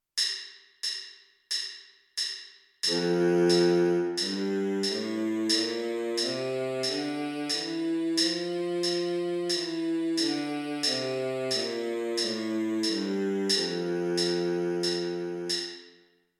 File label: d major scale baritone sax